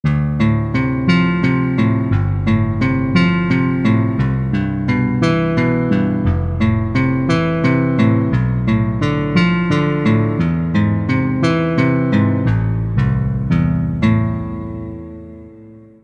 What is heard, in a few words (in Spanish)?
Guitarra